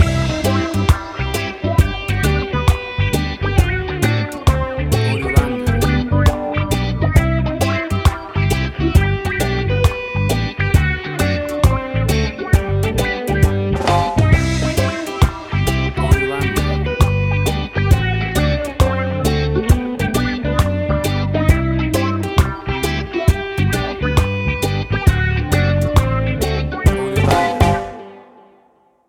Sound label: Cute Happy Reggae Summer Music